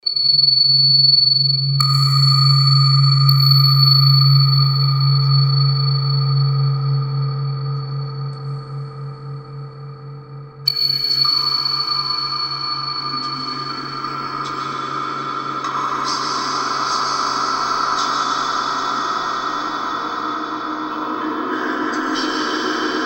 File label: Cave Dweller Drone; Best Of N2O Library; Drones & Foundations